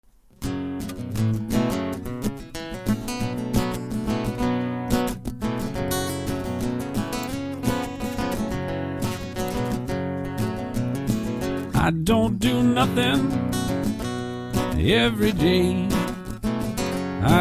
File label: blues